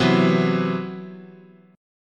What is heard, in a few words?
C Major 7th Flat 5th, guitar chord